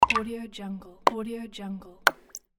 bloop pop

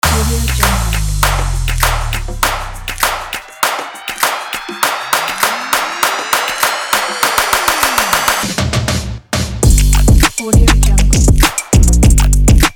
rap on

